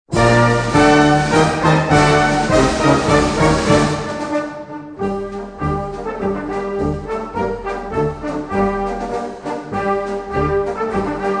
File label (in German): Marsch